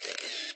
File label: camera; pou